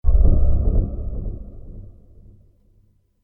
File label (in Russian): orkestr kripovij misticheskij akkord